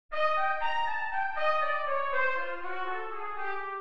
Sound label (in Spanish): Min7 inv0 en C